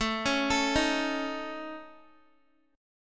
A Major Flat 5th, Guitar; x,12,13,14,15,x; AMb5 arpeggiated

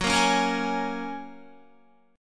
Gb Major Add 9th, down, Guitar

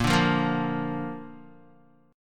Bb Major, Guitar; 6,5,3,3,5,x; 4E,4A,5D,5G,5C,6F; Bb strummed; JGuitar

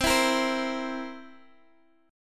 B sharp 7th, B sharp; B#7; strummed

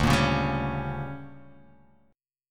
D Major 7th Suspended 4th Sharp 5th, chord

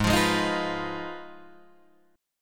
Ab Minor 6th down Guitar Drop D; strummed; JGuitar

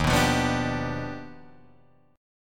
Eb Augmented Major 9th Eb down Guitar Drop D

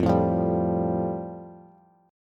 F Major Add 9th, Bass; Fadd9 strummed; JGuitar

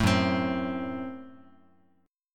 F sharp Diminished F sharp, Guitar; strummed; 0,2,3,x,x,x; 4A,4E,5A,5D,5G#,6C#

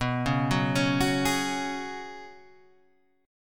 Gb Suspended 2nd Suspended 4th B

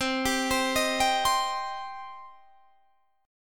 B sharp Minor B sharp

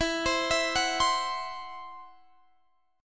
C-Major Flat 5th E, guitar

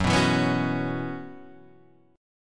B Suspended 2nd Suspended 4th, F sharp, chord

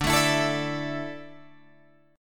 D Suspended 2nd D down Guitar Drop D

